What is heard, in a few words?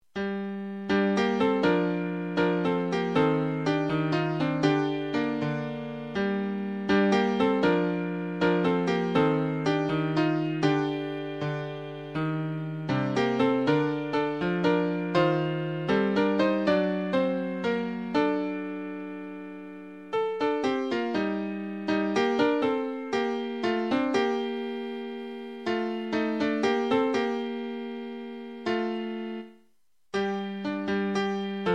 midi tenor